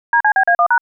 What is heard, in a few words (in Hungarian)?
Magyar adas bekapcsolo Jingle; SZEKELYUDVARHELY; NAGYVARADI ATVALTO SZIGNAL